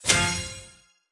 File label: Trial Ladder Progress Step; subway surfers city sound effects